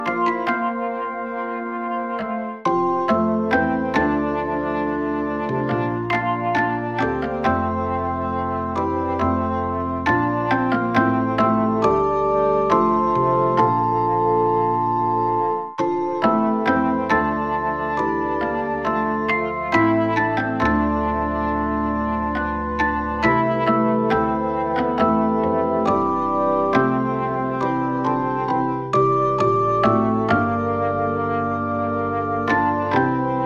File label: Hammond B3 Percussive Key Click; Master Hammond B3; Syntheway